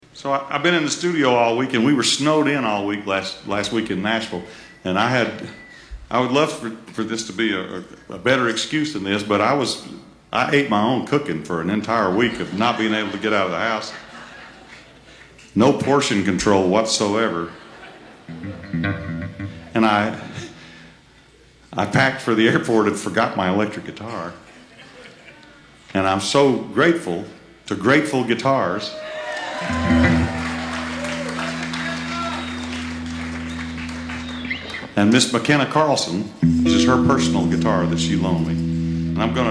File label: pouring down rain; keywest